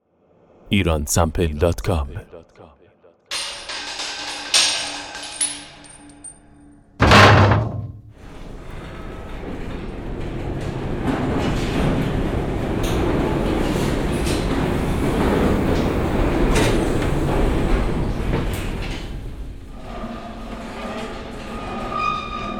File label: Metals, industrial metals